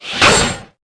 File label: Weapons Slinky clink